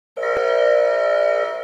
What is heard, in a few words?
alarm kinda